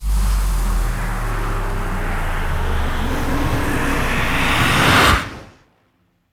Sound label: conjure reanimate; conjuration